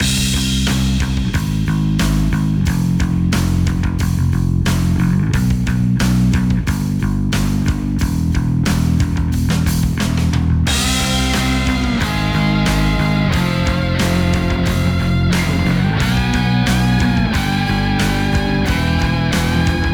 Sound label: Opponent Goal; mario strikers football battle league; Peach